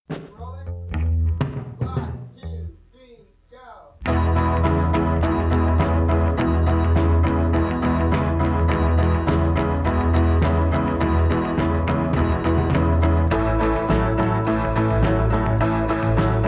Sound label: backing track instrumental